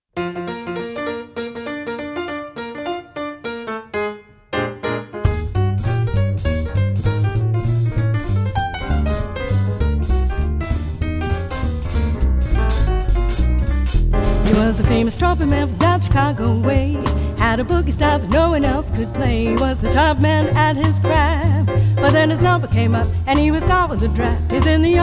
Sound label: Boogie Woogie